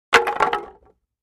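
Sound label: 2x4 Several Planks Dropped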